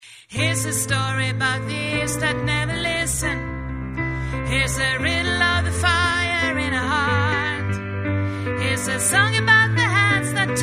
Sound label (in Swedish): sopran